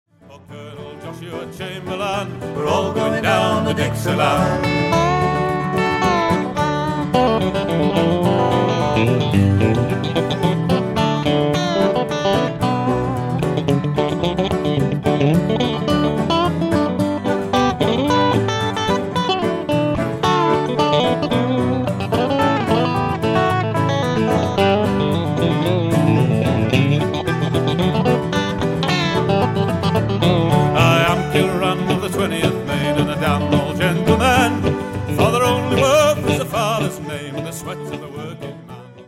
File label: dixieland